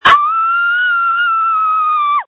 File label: screams